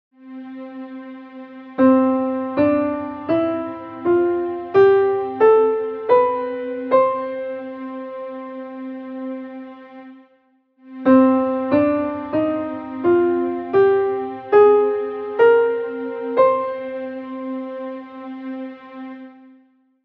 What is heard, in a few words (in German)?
C Dur Tonleiter und C Moll Tonleiter